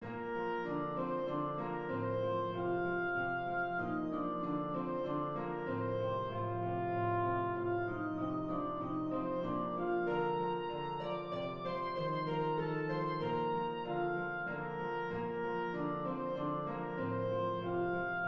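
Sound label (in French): danse allemande, si bémol majeur; haydn joseph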